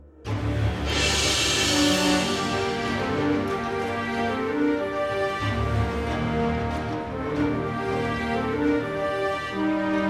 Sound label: orchestral action